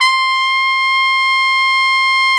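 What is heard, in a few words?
BRS RCA TP, Brass Trumpet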